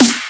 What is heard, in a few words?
Snare